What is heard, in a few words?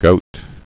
goat